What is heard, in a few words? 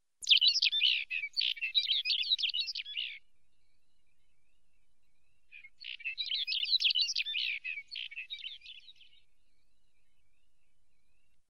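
podorozhnik golos, Lapland longspur voice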